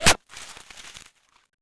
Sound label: snow hit; projectiles; bullethit; Max Payne